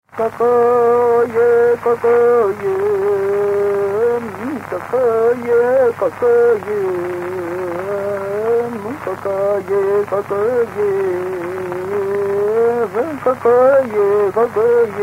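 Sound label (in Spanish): Canto de mujer, Mapuche; Música Mapuche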